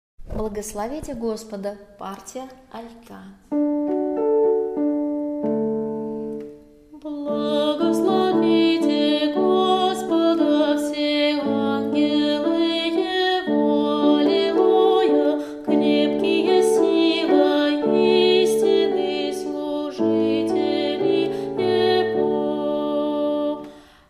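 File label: alto; Blagoslovite Gospoda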